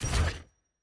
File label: UI Menu Settings Reset Click